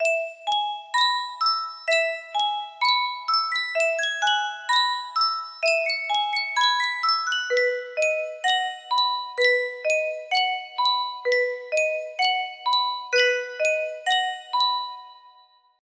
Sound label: Yunsheng custom tune music box